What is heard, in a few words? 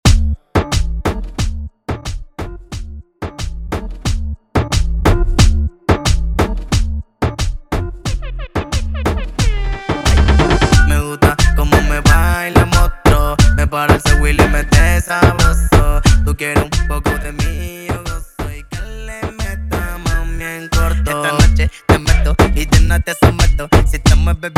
Xcelana Intro Break 90 Bpm